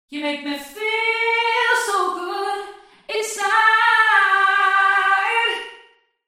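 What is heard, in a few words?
spacious chorus vocals wet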